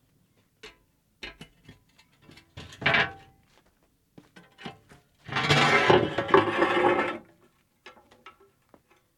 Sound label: Manhole remove